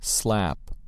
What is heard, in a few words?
slap